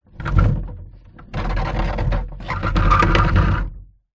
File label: household wheelie bin small movement on concrete ground recorded inside